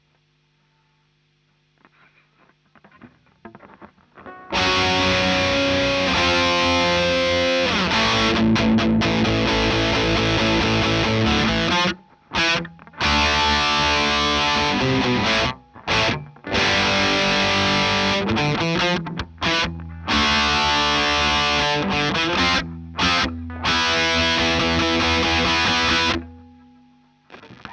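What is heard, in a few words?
guitar; Marshal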